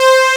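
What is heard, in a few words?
PWM down C4; Analogon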